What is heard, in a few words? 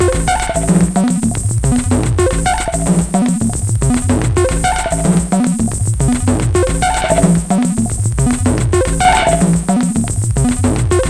trails, Beats; Polyend